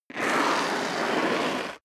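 step dress boots concrete grit slide long, footsteps; schritte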